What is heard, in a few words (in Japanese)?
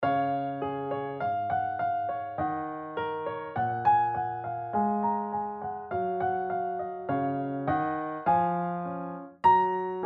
ピアノ教材的な感じ; ジングル